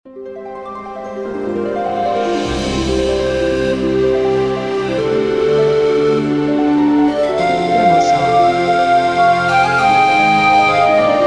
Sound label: Magic Soft Bright Intro or Happy Ending